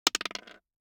DICE on Hard Wood, Throw and Roll, Standard, 1 One Die; Samples; ArticulatedSounds; DICE Sfx Party (Extended)